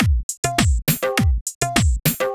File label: Dholki Beat